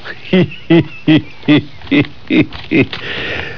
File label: heh heh